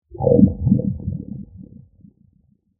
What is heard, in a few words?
Plasma Bubble, Scifi; Science, Fiction; BlastWave